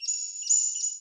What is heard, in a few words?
ambience sanctuary birds